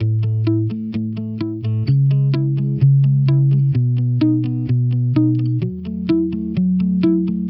Electric Pop Guitar 128BPM C#min; Vengeance - Essential Clubsounds Vol.4

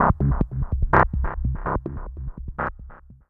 Rez Bass, Bass Lines; Sonic Foundry (Sony Creative Software); Electronic Point Blank; Basses

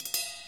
ride, drums; mercure drums

